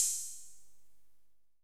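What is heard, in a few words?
opend hh, opened hi-hat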